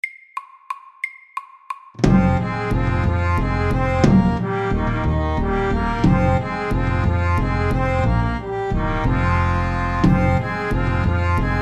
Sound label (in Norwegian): Sjanti FAST 180